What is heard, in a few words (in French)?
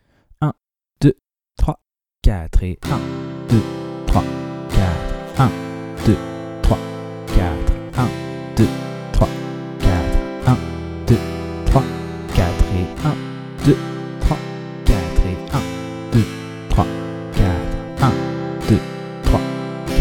rapide travailler la nouvelle grille avec la première rythmique; De 0 à vos premières suites d'accords